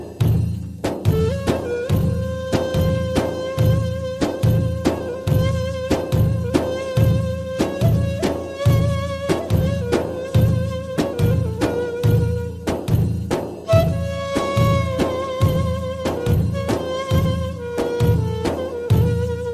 arabian sands

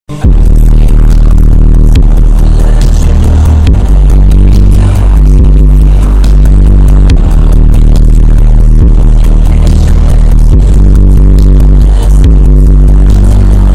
bass boosted

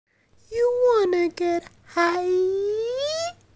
towlie acapella

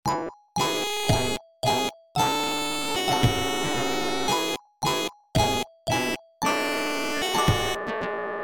wix sound

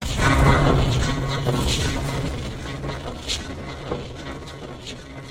combo tank toilet

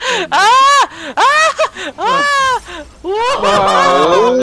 woaaahwowoahhh

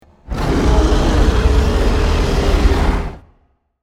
robo dragon roar

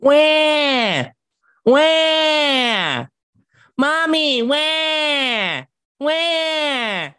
wahhh

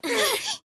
drumpad

